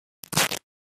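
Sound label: Cloth Split Rip Tear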